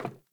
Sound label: Sandals Run Wood, Footsteps